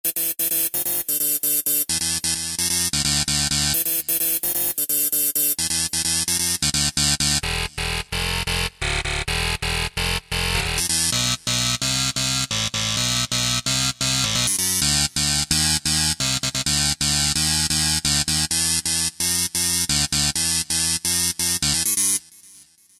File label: RAVE BEEP; Best Service Alpha Dance II